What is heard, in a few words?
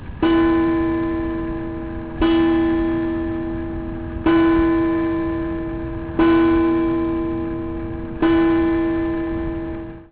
clock